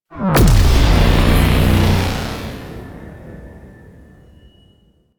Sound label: Reinforce Portal Start; Warframe; Venus; Reinforcement Beacon